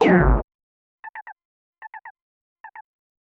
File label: fist punching f, punch; synthosep